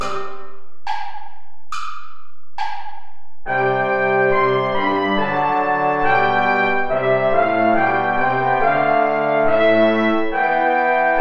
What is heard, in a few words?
play along without trumpet